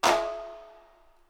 INDO PERC; Crypton - DrumBase5000

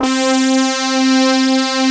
EDGE SAW; Pro-Rec - Synthsonic; TRINITY

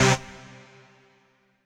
HOUSE, House Dance

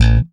Bass, pull A1; Sampleheads; Will Lee Bass Library

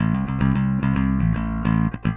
Bass, Indie Rock; Sonic Foundry (Sony Creative Software); Indie Rock Anthems; Session 01 - Brighton

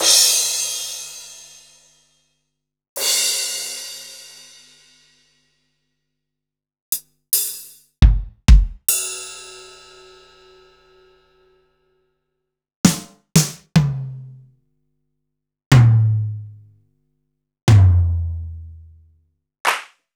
Akai MPC500 Fusion Kit

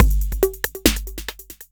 Break Pattern, Drums

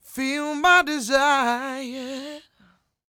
CROON, Vocal Blues & Soul MELLOW

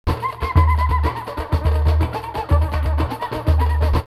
Manaus 124, drumloops World & Ethnic; X-Static Goldmine

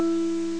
silent piano; Amiga Soundtracker Sample Packs

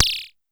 Pollard Perc, Syndrum

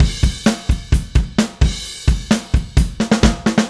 Drums Fill, Indie Rock; Sonic Foundry (Sony Creative Software); Indie Rock Anthems; Session 10 - Roswell

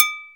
CAMBRA DINK, AFRICAN PERC; Greytsounds VOL-1

